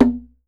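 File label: large brass, dunbek; Voice Crystal - Steve Reid Definitive Percussion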